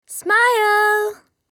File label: Taking Photos